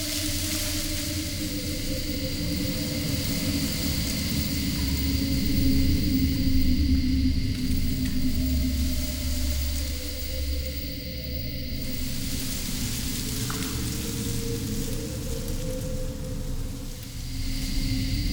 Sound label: Exterior Foreground Forest Scared Sad Loop; Warframe; Duviri